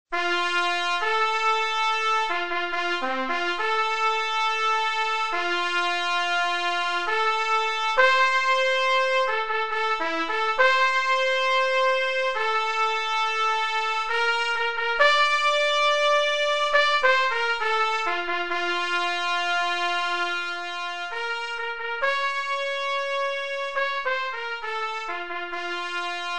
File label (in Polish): hejnal; chrzanow